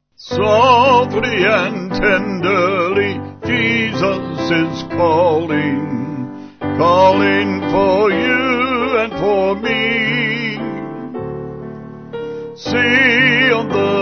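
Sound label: Vocals Piano, church music backing; Softly And Tenderly; Thompson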